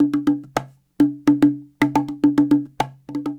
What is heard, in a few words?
Cahon